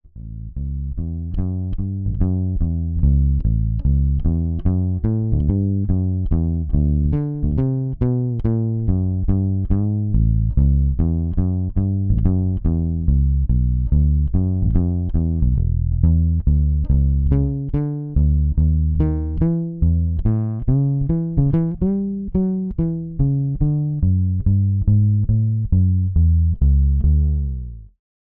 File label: Fretless Walking Double Bass